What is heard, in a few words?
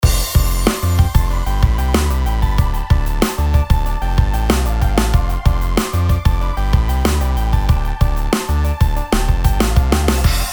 normal drums; algorithm